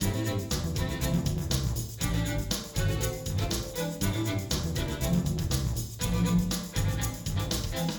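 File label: band reverb, ambisonics to binaural, order 1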